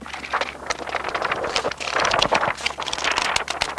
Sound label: book pages